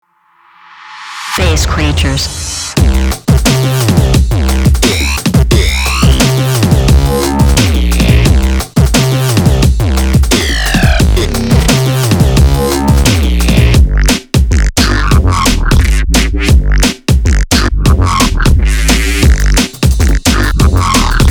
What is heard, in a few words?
Bass Creatures